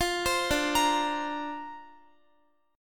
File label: D-Minor 7th Sharp 5th D Guitar, chord; Dm7#5 arpeggiated; x,x,15,17,14,17